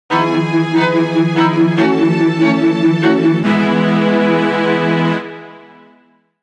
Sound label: alarm, ringtones; Sony Ericsson T650; Asana alarm